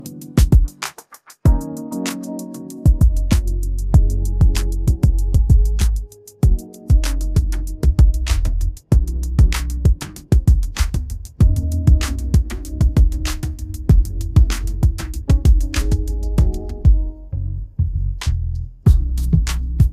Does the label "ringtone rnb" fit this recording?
Yes